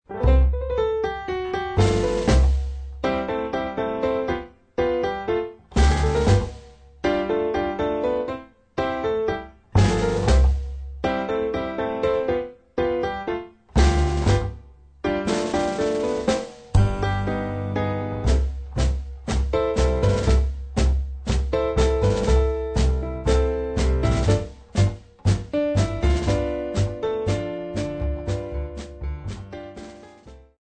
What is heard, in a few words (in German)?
tango